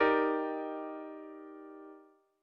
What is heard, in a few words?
Eb maj7; ackordtabell